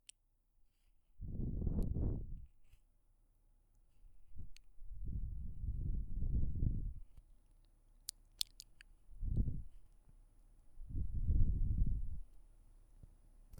ふーふー耳舐め, ASMR

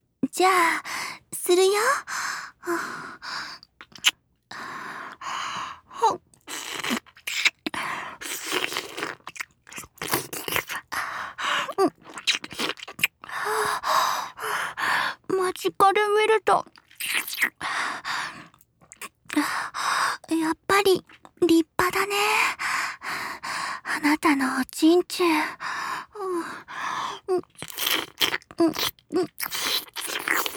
お姉さんのフェラチオ, ASMR

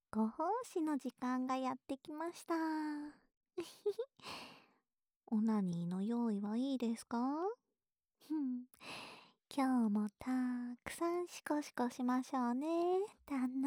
耳舐めループ（右耳）; 竜胆によるオナニー用耳舐めループ

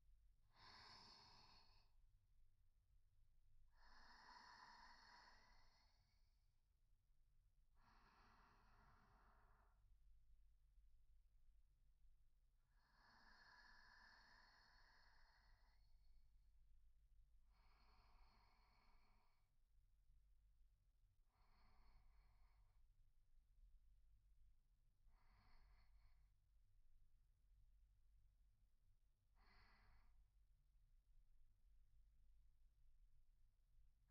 右耳添い寝ループ

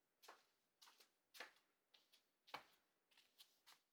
畳を歩く; 動作系

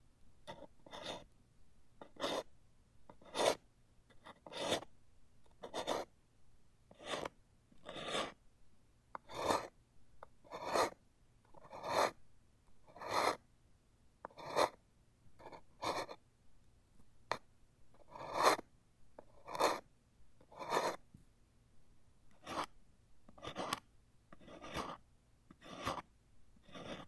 耳かき 右

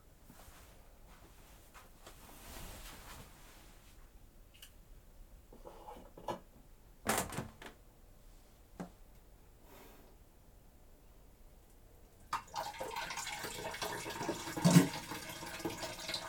放屁放尿脱糞音声集(きばっている音も含めたロングバージョン)

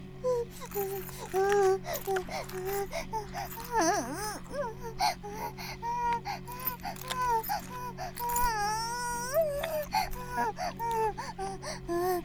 バイブ放置, ASMR

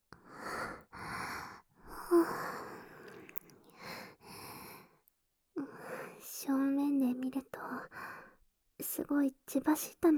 愛撫 エッチな耳舐め

